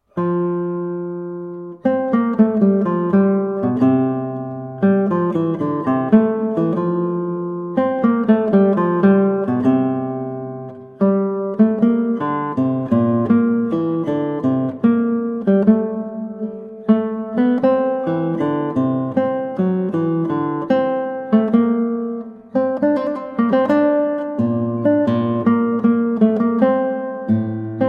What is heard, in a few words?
Adagio, guitar, spoken; Fantasia 2 for Flute TWV 40 3; Georg Philip Telemann